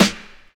snare sample g key, snare drum tuned to the G note. Loudest frequency: 2017Hz